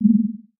interface button, clicks

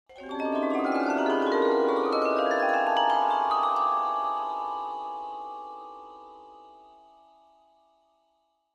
Vibraphone Magical, mallets vibraphone

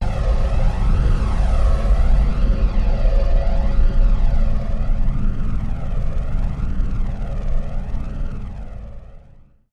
DEPARTURE, spaceship fx

